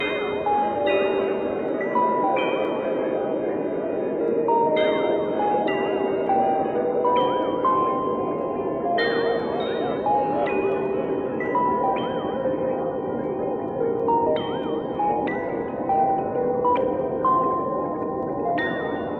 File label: space ping pong robots texture 100 bpm; synth loops